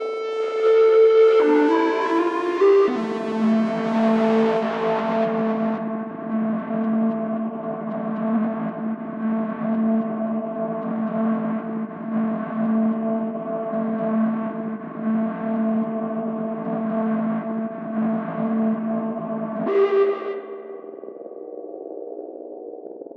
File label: Strymon Magneto Tape Control w Step Sequencer Key CV